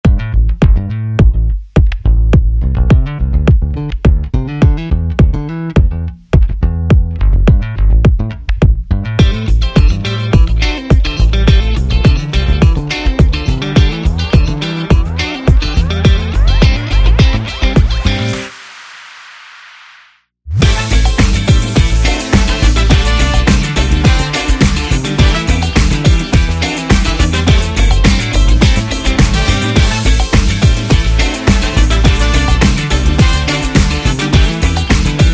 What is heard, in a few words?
A Funk